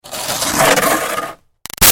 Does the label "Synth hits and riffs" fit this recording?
No